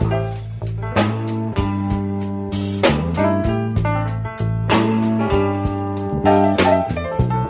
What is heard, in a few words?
piano blue, Phonky loops